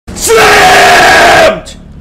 slammed

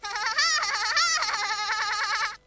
mole laughing

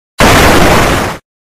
zombie roof spawn

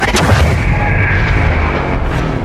wreck; asphalt 9

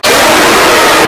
jumpscare sound; five nights at taylets 3

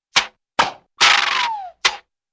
tiny plane with empty fuel tank falls

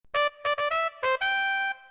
flash trumpet sfx; adobe flash

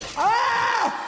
scream; angry birds 2 movie

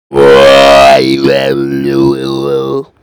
oahhhhhh

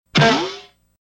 bonk and cymbal

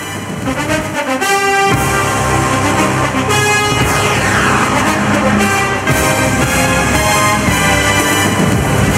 football music

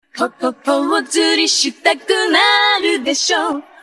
pre chorus

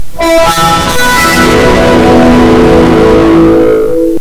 windows 7 distorted

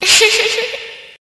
robo laugh

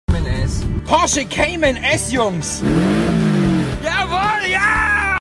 porsche cayman s

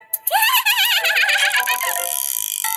cute giggle

